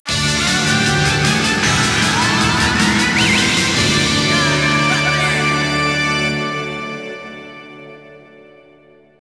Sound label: Str G#